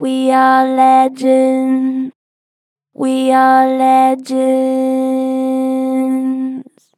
Str Flat We are legends, Vocals, Pitch Flat Strong